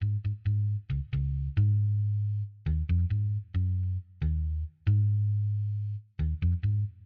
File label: instru basse